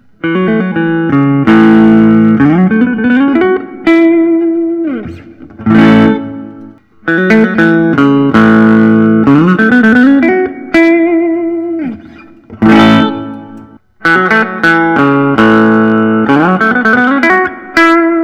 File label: Gibson Custom true historic; dirty